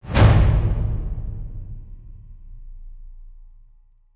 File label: Zero Slam Whoosh; Gothic Storm Music